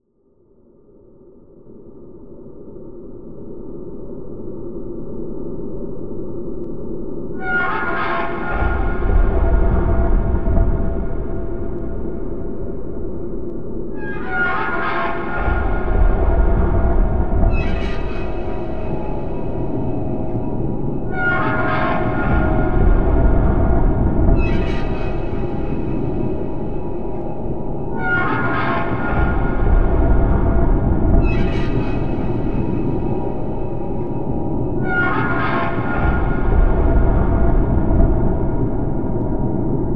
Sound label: Creaking Space, dark ambient; The Fall - Soundtrack